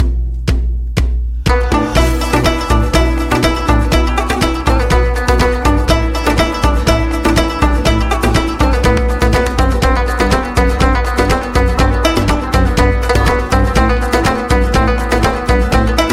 Music

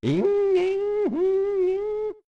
Singing

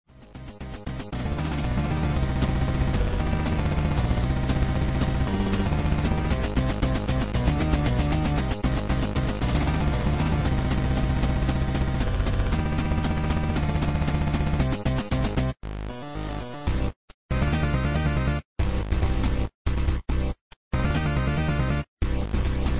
tractor pull 8 bit version

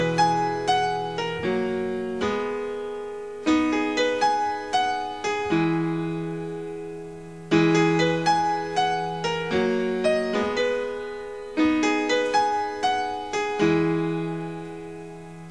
Piano

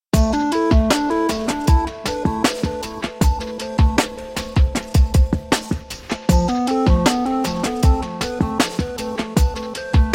Relaxing Ringtone

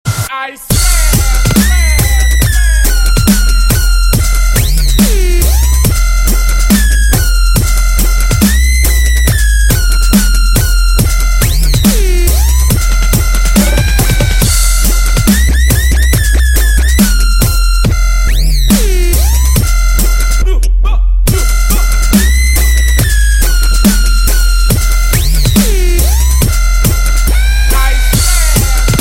dubstep; electronic

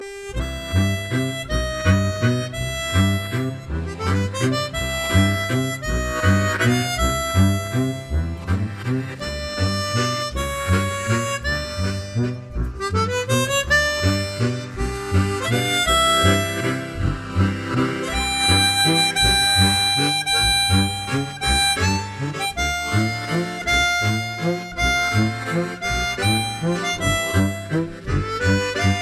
level; mario

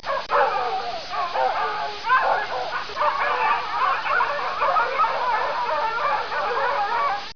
mourning dove; animals

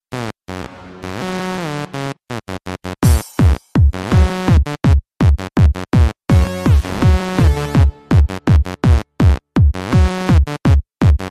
the moan beat

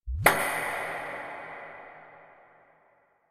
Ping Pong

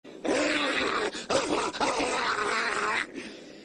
guy imitating dog attack

